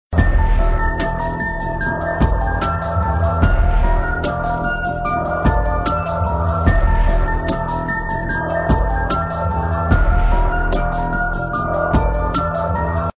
Scarry Sound

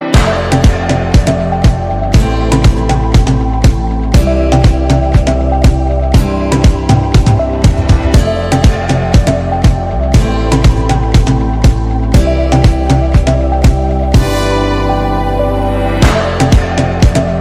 Magical

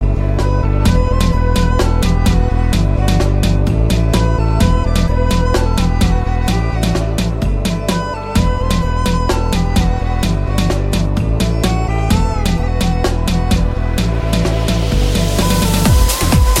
Drum Mix

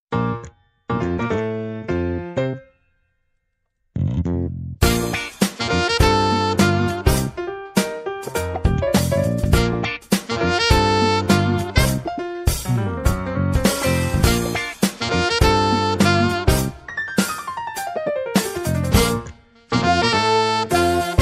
Jazzy

